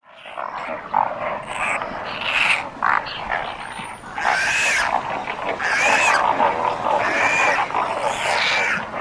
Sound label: Pelecanus occidentalis, water birds; Chordata